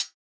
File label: closed hh; eddyflux